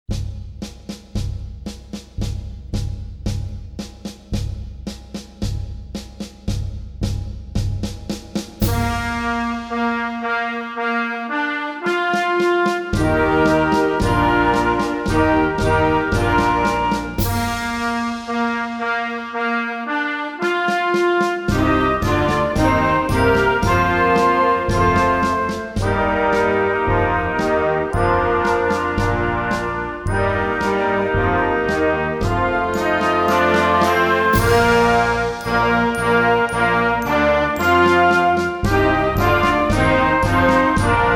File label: gallant march